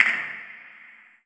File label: lo-fi, drum machines; 300 Drum Machines; E-MU Orbit-3